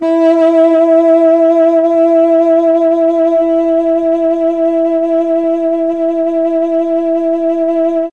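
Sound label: E3 tenor sax, mellotron; CHMB TNR SAX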